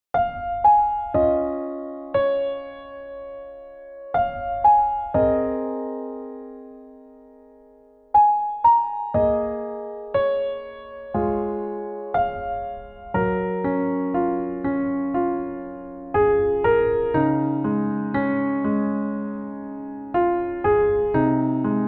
Newage, 감성

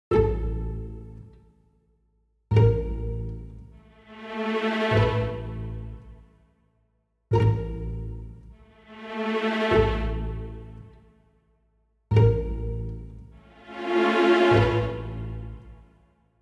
Bell

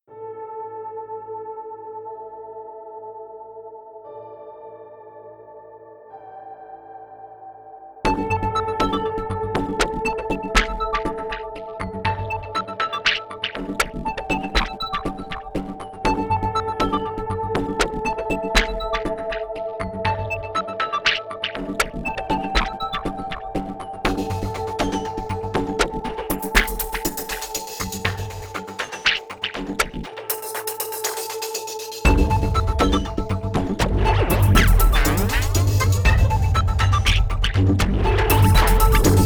Electronic Thriller, EDM 리듬

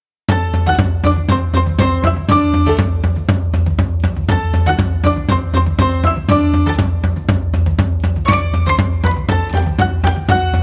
Get Up, KIDS 귀여운

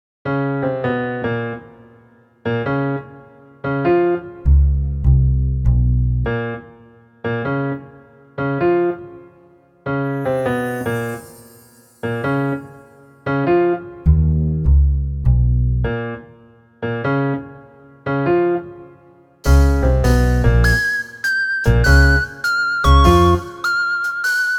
Rain; usimmusic

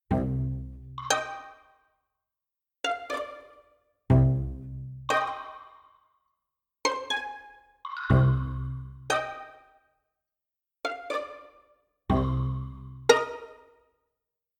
Comic, Comic Slow

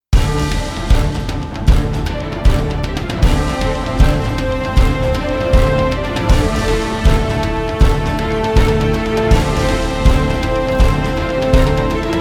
Monster, Tension

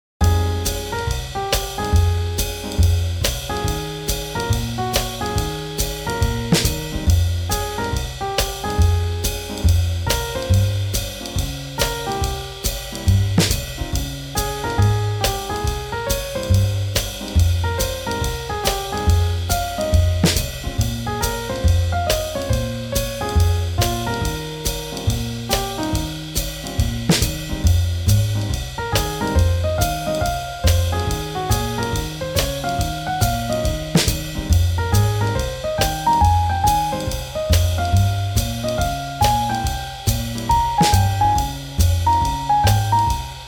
Swing Jazz

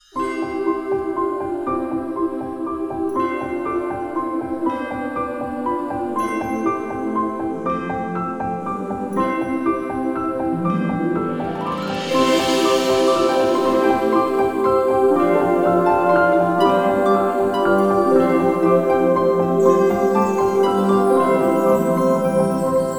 Christmas Style

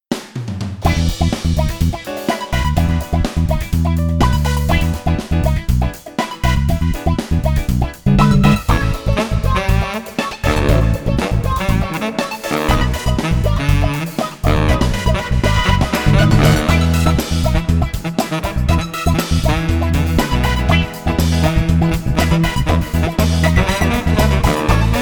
Funky With Saxophone

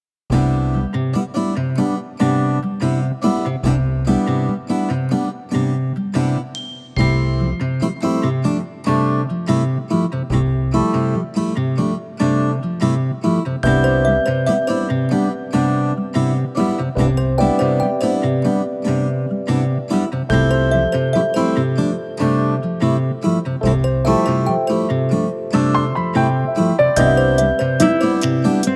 Samba Days; 밝고 맑은

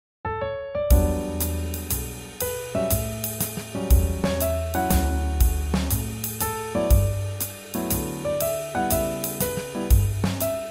Swing Jazz

